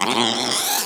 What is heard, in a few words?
death